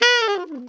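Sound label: ALTO short fall B 3, Sax; Giga Samples Collection; ALTO SHTFALL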